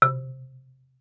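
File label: Marimba deadstroke fortissimo C2; MIS Pitches - 2014; Percussion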